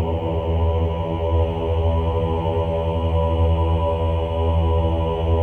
choir ohs E2; Spectrasonics; Symphony of Voices

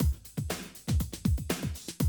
120, Classic Drum Loops; Best Service; XXL 1500 Classic DrumLoops; Sample CDs

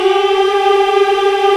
African Mm f; Roland LCDP11 Africa VOL-1

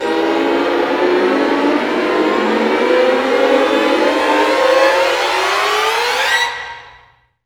STR SEC, STRING GLISS; Roland - LA Composer vol. 1

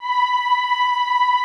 OOH B4, Classical Choir; Best Service; Extended Classical Choir; OOH MIX

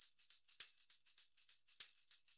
TAMB, Percussionworks; Sampleheads; NYC Percussionworks; SF LOOPS 100

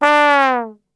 D 2 TRB, Brass Super Section; Best Service; 90 s Sample CDs

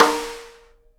snare RIM RING, Live Snares

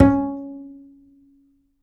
G, Upright Acoustic Bass

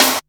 snare, Disco House; USB Soundscan vol.20; Fresh Disco House I; AKAI

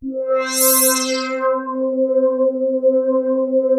TEXTURE C5; Greytsounds; Scott Peers Sound Engineering

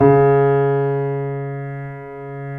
YC7 piano, pp; Roland L-CD701; pp YC7 St

